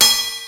TR, drums perc, Roland

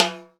timbales; AKAI S-Series CD-ROM Sound Library VOL-5

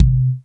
SINE ATK, SONIC DRUMS; Greytsounds VOL-1

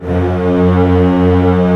STR C0 FF, Orchestra; Sound On Sound - Peter Siedleczek's Orchestra

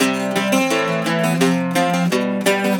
Celtic Flavours; USB Soundscan vol.42; AKAI

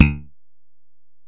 Bell, Alesis DM-PRO

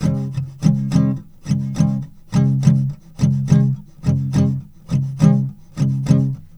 D 3 L, Guitars, SLOW SHUF; Spectrasonics; Hans Zimmer Guitars VOL-1

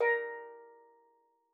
STEELDRUM A#3; Xsample VOL-4

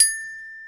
A4 glock; InVision Interactive; Lightware VOL-1; Instruments & Percussions; GLOCKENSPIEL

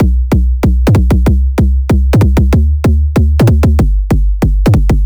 808 bass

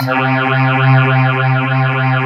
C2, Synth; WATERMUSE

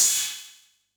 CRASH, Yamaha DD-65 CYMB

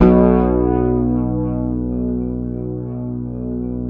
Fingered bass B1 R; Sampleheads; Will Lee Bass Library

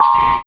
Metals Kick

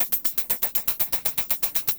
120BPM LOOPS; Bolder Sounds - Granular

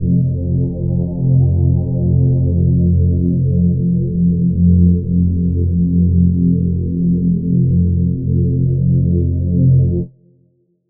drone rich F

